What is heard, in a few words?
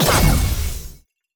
Rover Drive Break